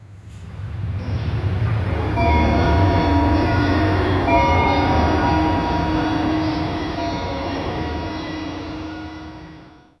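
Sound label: palace ambience with distant, bells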